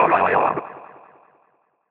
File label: misc oneshot, FX; GhostHack